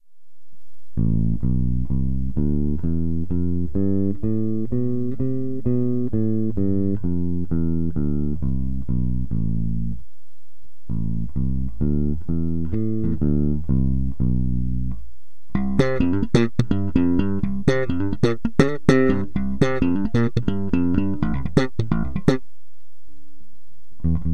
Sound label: fender flats